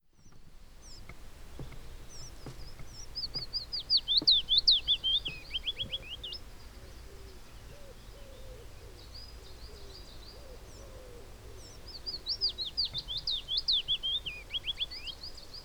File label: Willow Warbler Phylloscopus trochilus trochilus